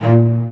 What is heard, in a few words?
CELLO BOW